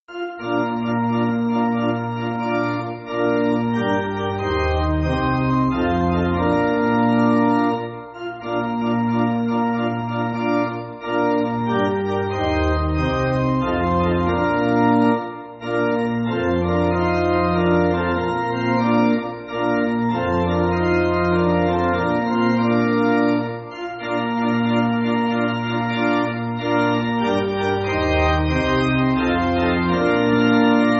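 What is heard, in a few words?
Pipe, Pipe Organ, Music Backing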